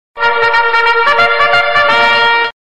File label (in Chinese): hao jiao